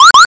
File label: warning